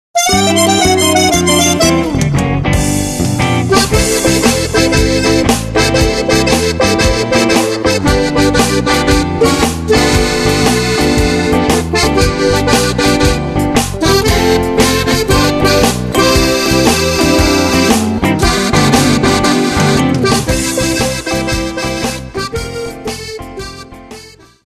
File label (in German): hochzeitsblues